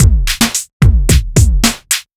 Beat 110bpm; musicradar

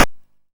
Snare, YM, CHIPSHOP HITS DRUMS; ChipShop Deluxe

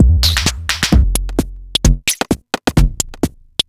Beat (130BPM), techno; techno drum fx

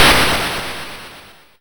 YM Noise; NOISES; ChipShop Deluxe; ChipShop Hits

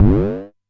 Amdek Perc

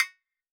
Tech Drum Hit, techno 120bpm

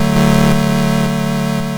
YM Chord F#m; ChipShop Deluxe; ChipShop Hits

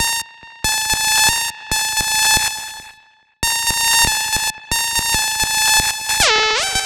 Synth Am, 140 BPM; loops; CHIPSHOP DELUXE

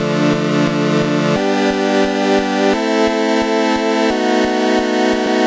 PO Chord Bm, 175 BPM; loops; CHIPSHOP DELUXE

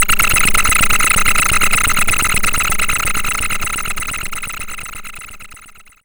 YM Rats; SFX; CHIPSHOP DELUXE; CHIPSHOP HITS

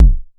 Vermona Kick; techno drum fx; Analogue DrumHits

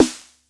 Jomox Snare; techno drum fx; Analogue DrumHits

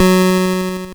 GB Lead F#; ChipShop Deluxe; ChipShop Hits

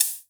Vermona Hat

